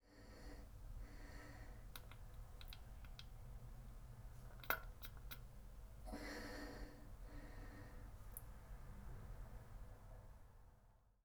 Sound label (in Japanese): おなら みちっぷすっ, トイレ盗聴 排泄音集